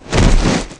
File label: Thud, Ice Beast